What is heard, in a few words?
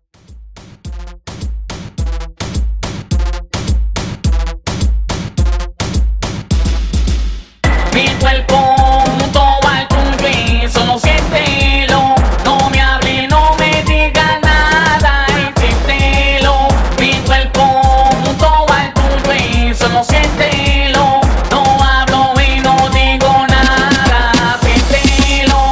Reggaeton Mixshow 106 Bpm